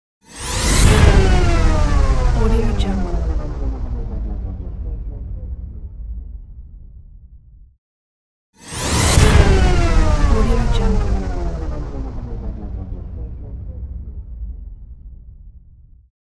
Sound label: stop motor